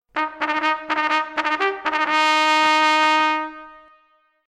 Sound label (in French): Sonnerie En Avant